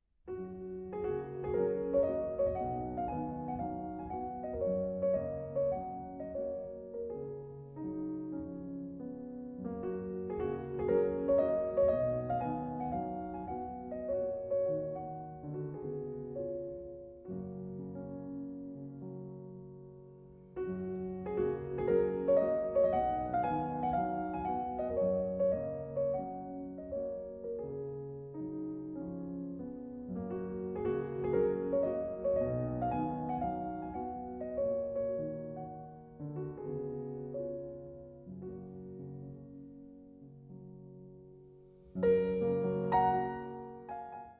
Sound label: Humoresques No 7 in G flat major Poco lento e grazioso